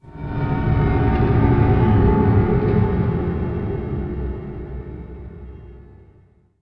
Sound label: IMPACT SCARY, Cinematic Impact; M-Audio Pro Sessions; Liquid Cinema